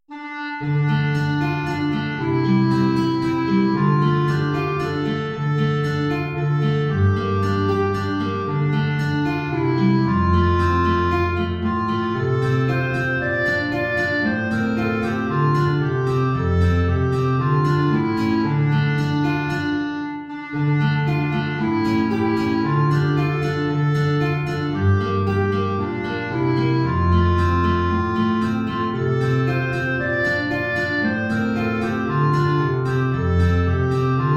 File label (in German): Klarinette in Bb Gitarre tief; Es kommt ein Schiff, geladen